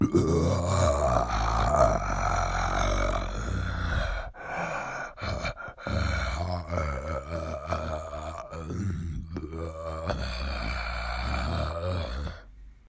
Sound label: parking middle, zombies